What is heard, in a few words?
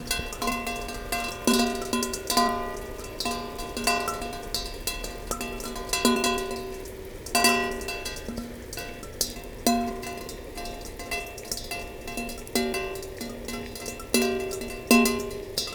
Field Recordings Oregon Rain Rain Under A Stainless Steel Bowl; Omni Gardens